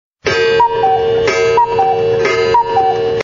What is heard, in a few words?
old clock alert; ringtones; sms